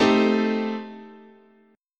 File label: A Minor 7th Flat 5th, guitar chord